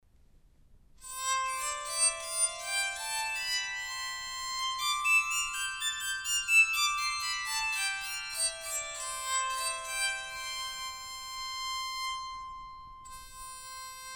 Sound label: cedar, bowed psaltery; Soprano